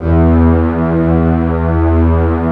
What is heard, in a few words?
MIDI CELLO, SYNTH STRING; Greytsounds VOL-1